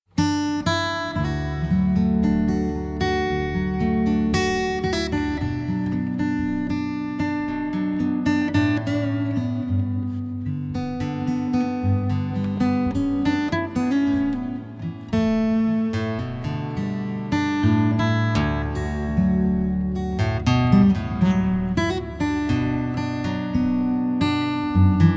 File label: Chant de Nuit